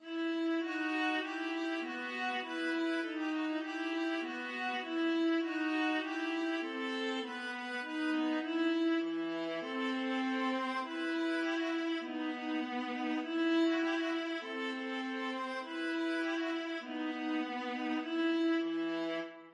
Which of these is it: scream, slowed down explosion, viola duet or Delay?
viola duet